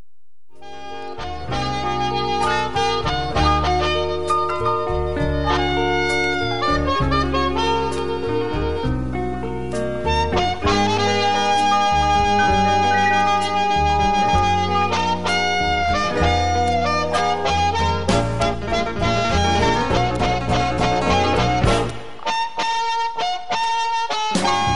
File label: harmonica